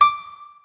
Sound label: piano